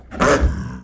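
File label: Panther Attack Voice; Civilization4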